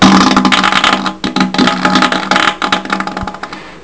champion, prout

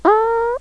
timide

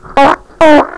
double, prout

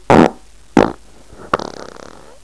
triple, prout

